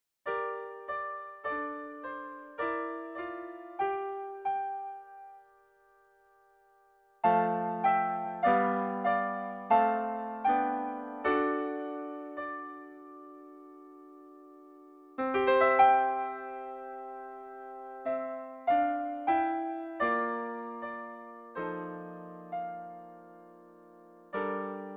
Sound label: Old Hungarian Tune; libri di pianoforte per i corsi di base